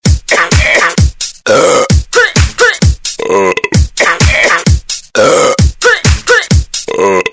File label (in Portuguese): arrotos e peidos